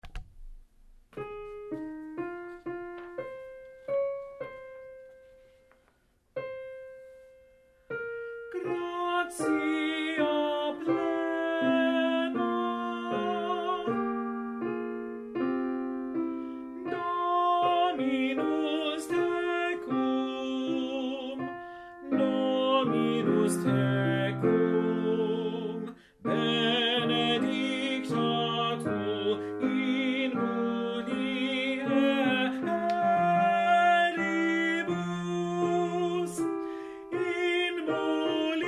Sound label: Tenor; Ave Maria, T. L. Victoria; Choral Resources; Tenor practice